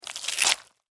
Spider Bite Fang, Spider, Bite, Fangs, Arachnid, Insect, Bug; Spiders; Blastwave; Animals:Insects